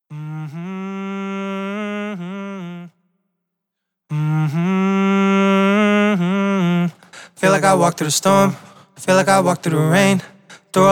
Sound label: HH Trap rap sing 71 EbM